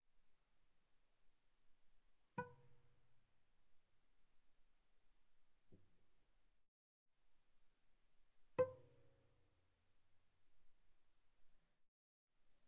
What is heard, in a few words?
Viola pizz sul A pp C6 A6 stereo